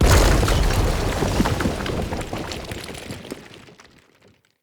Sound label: Pillar Stone Medium Destroy